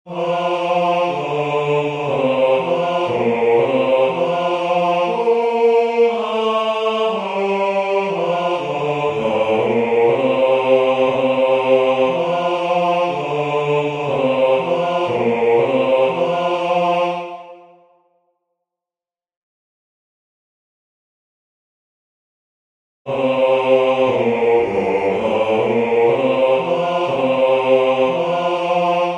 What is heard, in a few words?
Bass; Mon coeur